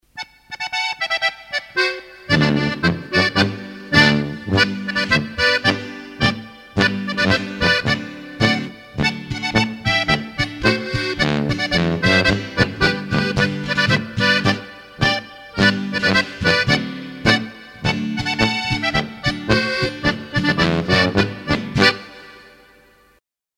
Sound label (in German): Plattler; Katz